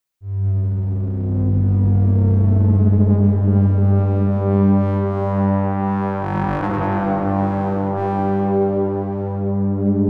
Rounds pad

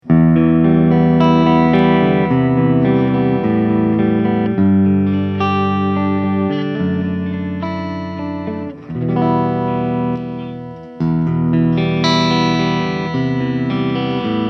Clean; Sun Valley Super Shredder Exotic FR Ziricote

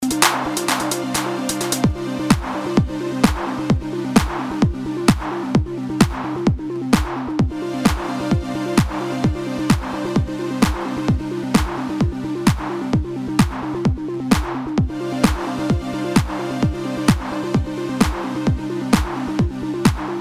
Trance

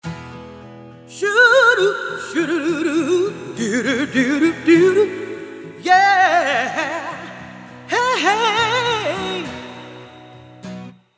BOSS VE1 ENH Green Echo Medium

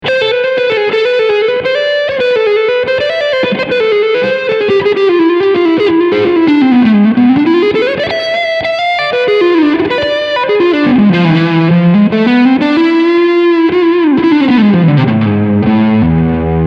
Short Reverb Overdrive

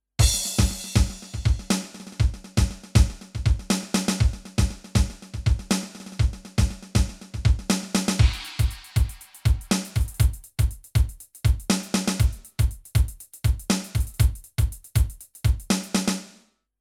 Groove

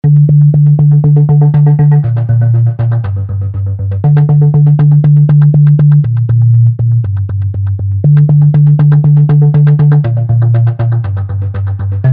Mosaic Bass Protocol RHY Macro Modulation; Heavyocity

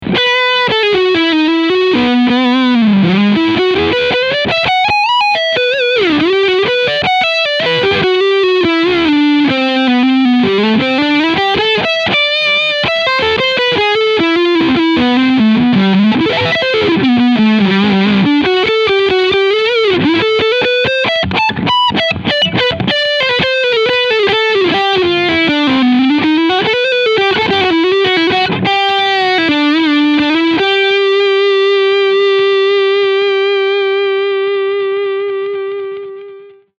Lead Front PU

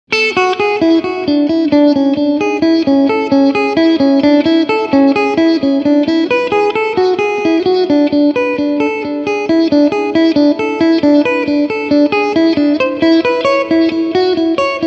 Egnater

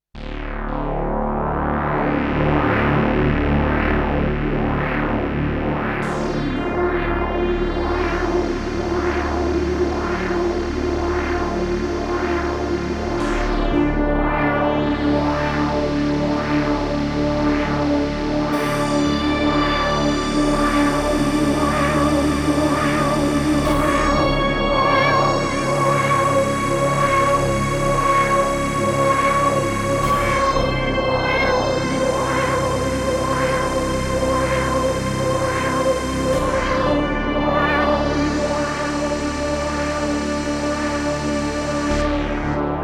Strymon Time Line Filter Synth

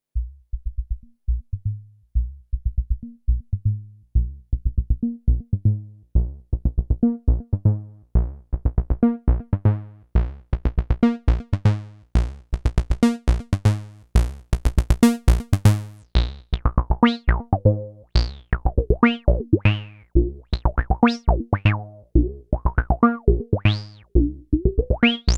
Bitrazer Dark Energy LPF

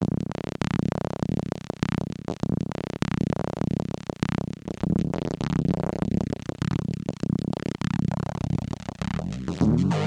vocoder drums ensemble effect

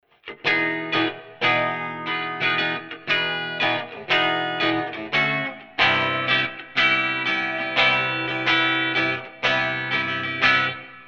E Guitar dry

